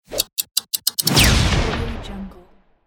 bomb ticking boom

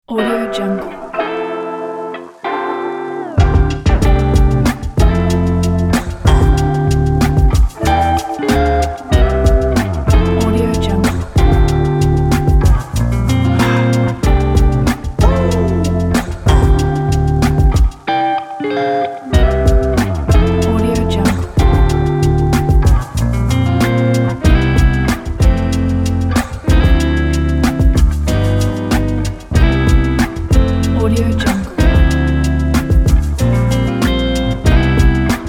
jazzy lofi hip hop

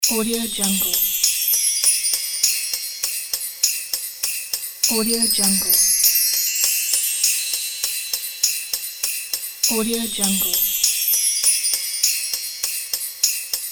christmas bells

